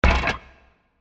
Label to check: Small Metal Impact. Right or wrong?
Right